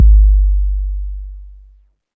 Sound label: warm kickdrum g key